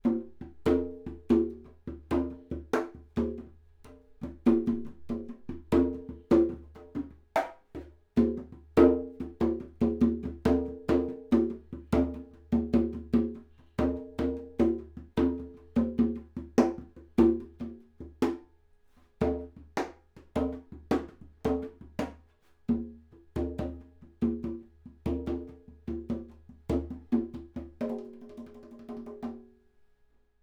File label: Spaced Omni Congas; Four couples of microphones have been placed in front of some instruments